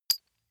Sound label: medium metal impact hard